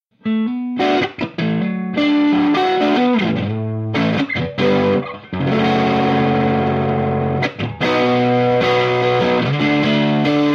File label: overdriven